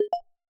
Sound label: walk; ゆめ2っき